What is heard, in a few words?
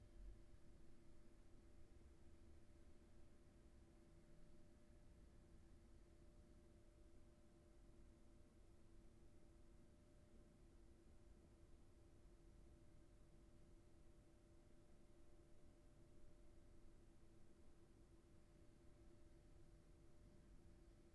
roomtone